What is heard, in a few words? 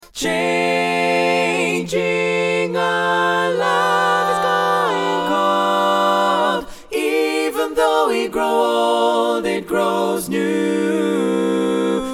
female